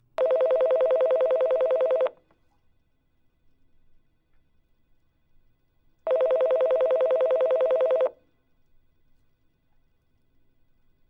Phone Telephone Ring Office Nice Iconic Close To Speaker